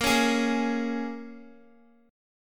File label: Bb Minor 7th Bb, Guitar; Bbm7 strummed